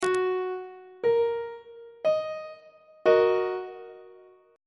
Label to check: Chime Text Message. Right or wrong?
Wrong